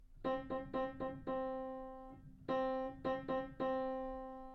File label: rhythm; alligator dog grasshopper dog; rhythm train book 1; hoppergrass